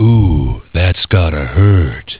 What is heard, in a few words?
hurt; evolutionvalley